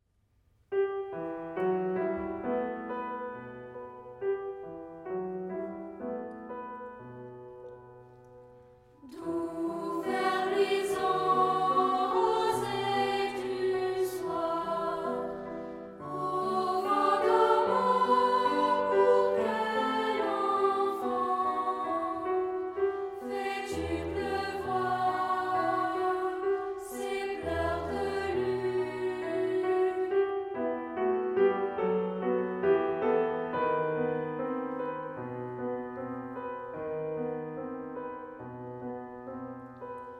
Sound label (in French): tutti